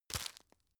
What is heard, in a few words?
tissue grab